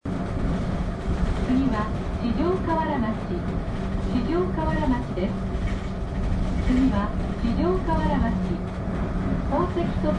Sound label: bus tape orgel; kansai; kyoto; kawaramachi